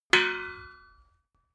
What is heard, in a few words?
metal impact pole